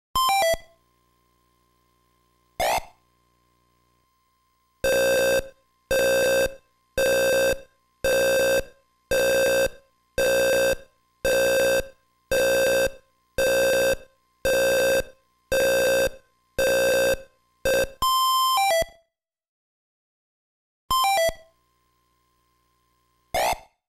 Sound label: Error - Card Failure Error; Game Sound Museum Famicom; Disk Writer; AB Level Error